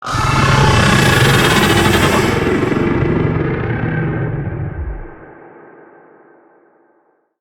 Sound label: Arachnoid Camper Vocal Death; Warframe; Corpus